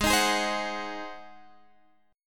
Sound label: Ab 7th Ab down; strummed